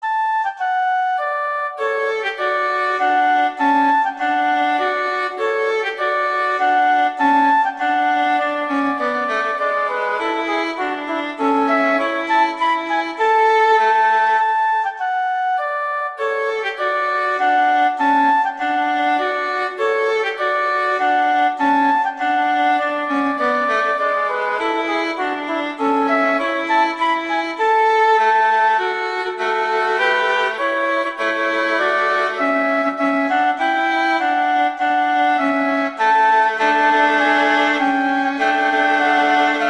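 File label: Duet, horn; Mozart; Duets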